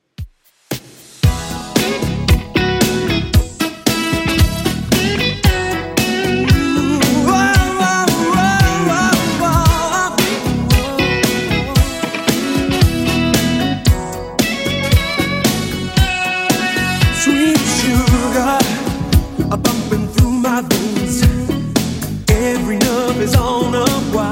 90s Redrum 114bpm

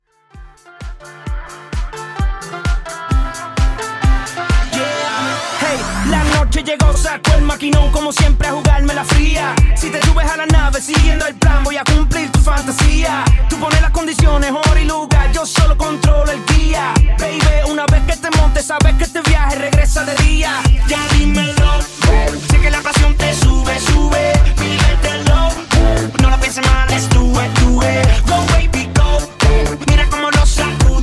Latin Club Redrum, 130Bpm

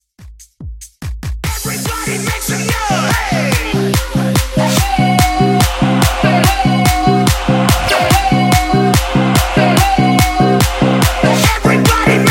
EDM Hype; Remixfreakz

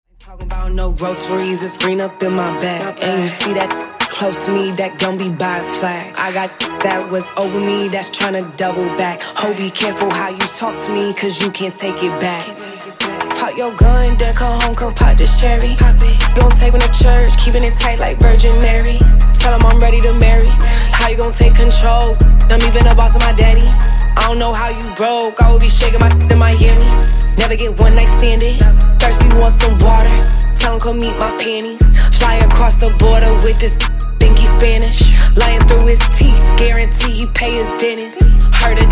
Funk Intro Outro 75 Bpm

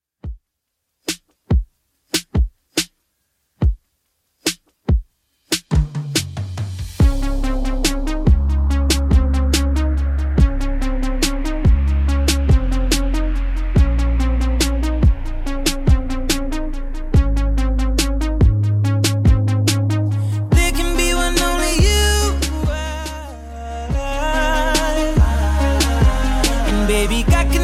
2ks RnB Redrum, 71 bpm

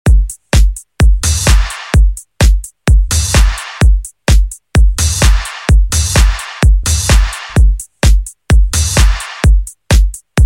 Dj Intro Outro 128Bpm

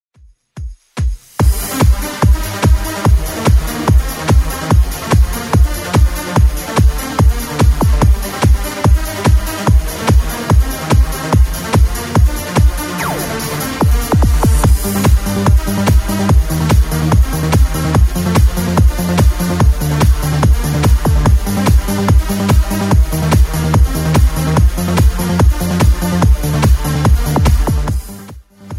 90s Redrum 145bpm

